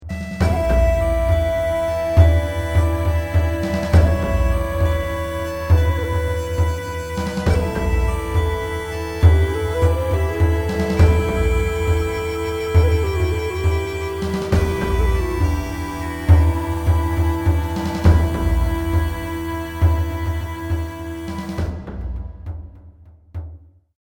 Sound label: Pipes; Banshee